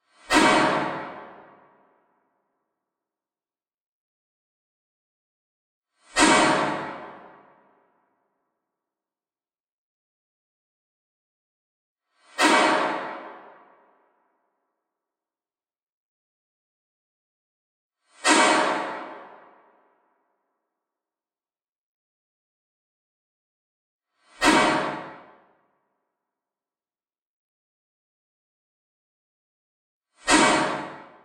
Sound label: Simulated Limelight Ignition Blast With Echos